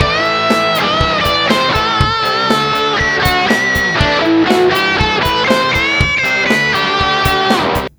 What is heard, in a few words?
SOLO Sample